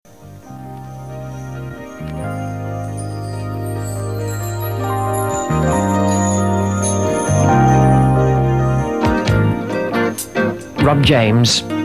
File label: jingle